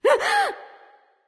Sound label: surprise; smite; voicepack; hel